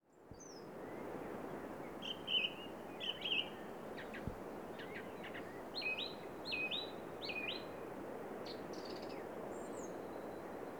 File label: Bird In Oostduinen